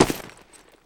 footsteps, dirt; player

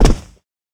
land carpet, footsteps

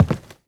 step, footsteps cardboard

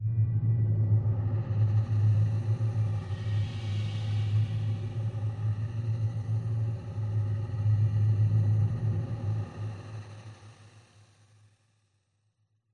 amb unique vault; Half Life Alyx

